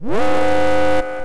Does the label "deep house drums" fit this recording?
No